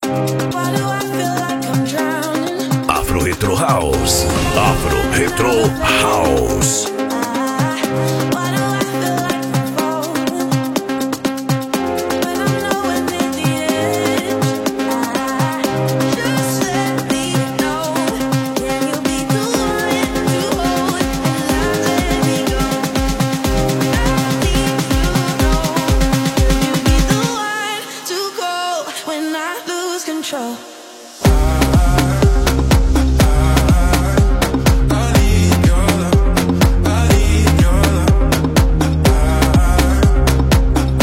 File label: Afro Retro House